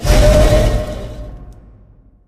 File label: piston start